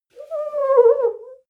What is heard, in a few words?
clean window paper towel slow howl